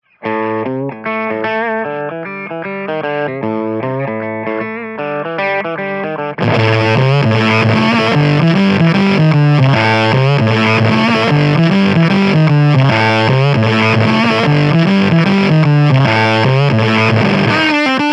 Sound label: fuzzy dark fuzzy, pedals